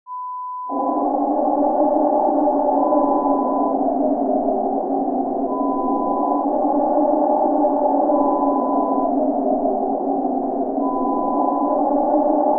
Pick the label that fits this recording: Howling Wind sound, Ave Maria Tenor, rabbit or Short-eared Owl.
Howling Wind sound